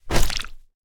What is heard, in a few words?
Hit And Splat; mighty party 2017 gamerip pc ios android